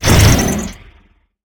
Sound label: staff fire; smite; ahpuch skin10